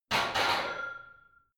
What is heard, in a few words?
barbell heavy plates replace rack reverby hard; sport freizeit; fitnessstudio